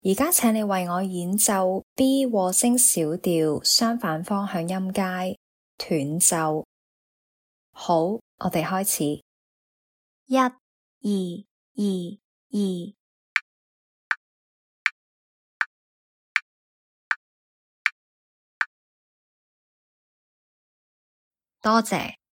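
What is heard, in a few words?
B minor harmonic contrary motion scale staccato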